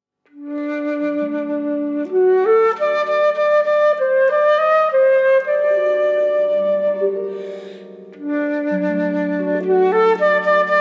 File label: KLEZMER